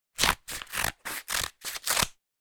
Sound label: paper scissors cutting; sonniss gdc